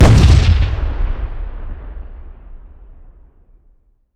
explosion grenade distant; Explosions

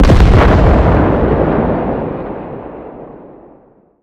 damage hits explosions; World Of Warships

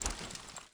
foley walk

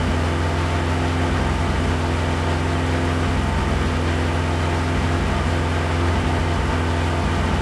m4a3 engine rpm; War Thunder

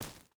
footstep barefoot gravel run; Rust

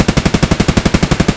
rpk16 outdoor far loop; Escape from Tarkov

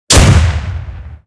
tank hit small; tanks; War Thunder

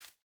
footstep burlap concrete jump start; Rust

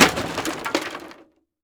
wood break; Rust

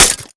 bullet glass, weapons impact; Call of Duty Modern Warfare

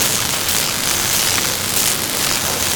spark fountain loop

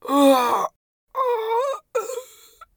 scav death, voice; Escape from Tarkov; scav5

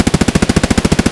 hk416 outdoor far loop; Escape from Tarkov

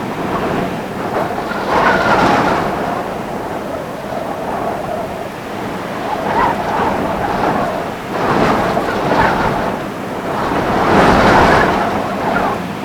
wind flat surface; Call of Duty Modern Warfare

